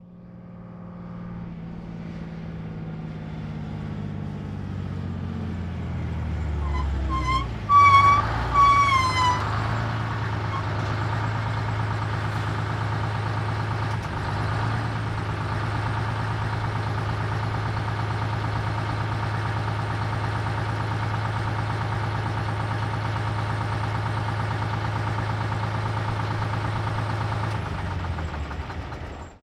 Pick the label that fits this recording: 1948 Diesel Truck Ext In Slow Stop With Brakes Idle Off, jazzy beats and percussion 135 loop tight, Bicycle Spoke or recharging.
1948 Diesel Truck Ext In Slow Stop With Brakes Idle Off